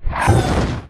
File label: Skill holy fires; 100rogues